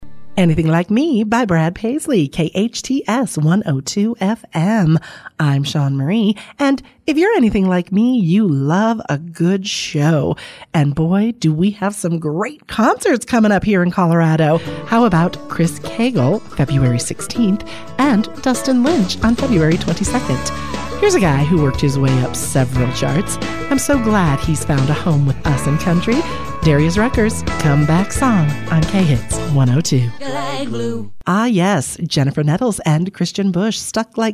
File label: Country